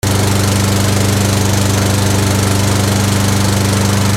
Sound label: GARAGE